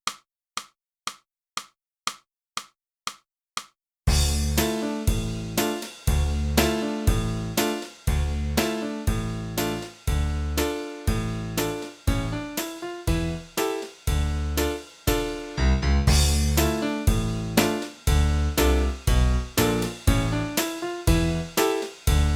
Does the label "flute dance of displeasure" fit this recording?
Yes